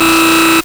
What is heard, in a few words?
LSDj, Nintendo Gameboy; 300 Drum Machines